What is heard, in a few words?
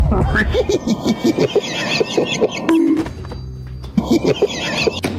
grohehehehe